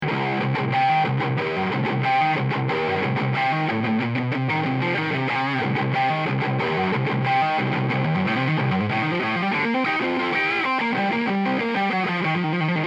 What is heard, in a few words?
Chisel Neck Distortion